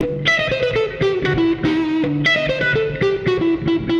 Guitar wet

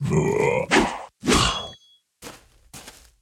Anubis Attack; Puzzle Chronicles; PS3; PSP; X360

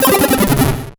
Solo Chip Arp Negative stereo; MUSIC EFFECT; Slette Mette android ios macos windows gamerip 2021

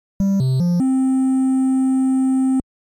Horn; Platinum Horn; Shadowgate

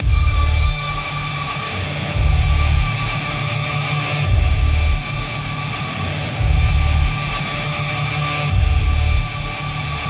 Metal Hell Main Ambient; Nekravol

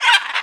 Bird Cage Idle

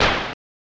Delete Sound; Game Genie; SNES

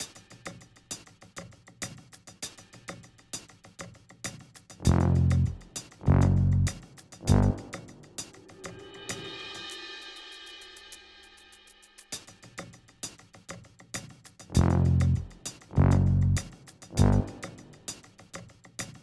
Quest Base Loop; bakugan champions of vestroia 2020; switch gamerip

